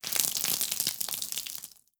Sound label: vomit splash drip layer; Half Life Alyx